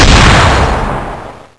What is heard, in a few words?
tank light fire; Light Tank City Lights2